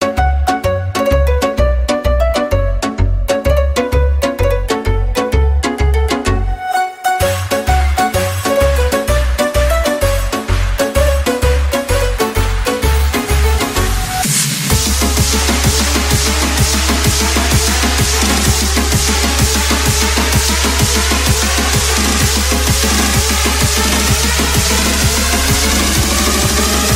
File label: big room